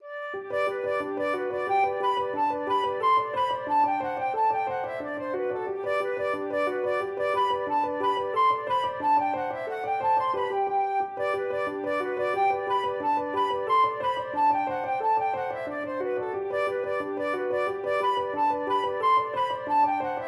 hornpipe, flute

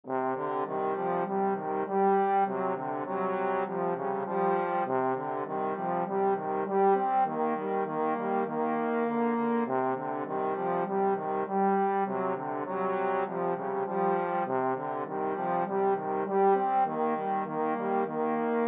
mountain king trombone

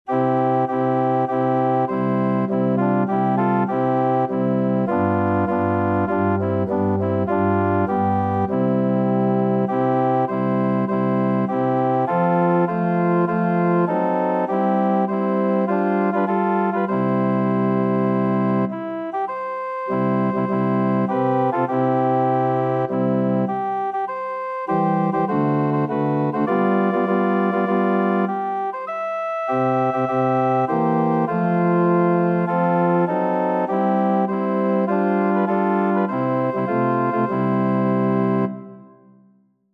wondrous love, organ

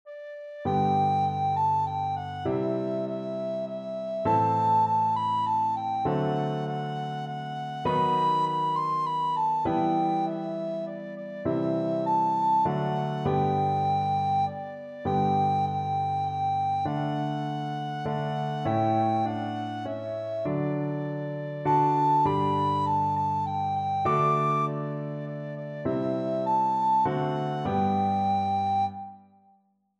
recorder

